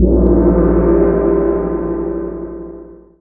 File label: gong; world